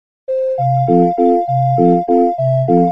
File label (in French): basse accord